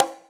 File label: Conga; Equipped Music; Loungin House